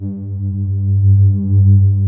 PADS; Pro-Rec - Synthsonic; Z1 PADS

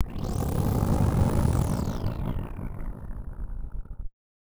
Malfunction Synth, malfunctioning synth samples

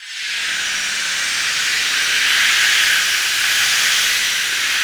SFX, 170 BPM; Ueberschall; Drum & Bass Resonance

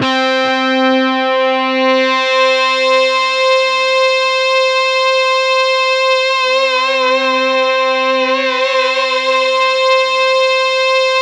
C3, TECHNOPAD, Roland JV 2080

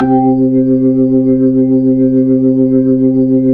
B-3, Jazz; Roland LCDP10 Keys of the 60s and 70s 2

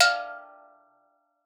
STEELDRUM F4; Xsample VOL-4

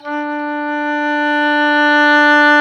oboe, Roland; Oboe Dry; Roland L-CDX-03 Disk 1; Oboe 4-8

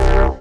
DM BAS, Dance Mega Synth

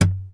MARTIN AC, Bass; Sampleheads; Will Lee Bass Library; MARTIN AC 4

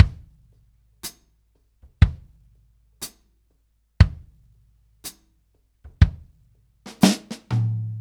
Ballad Loop, Drum, 70s Ballad; Drum Tools

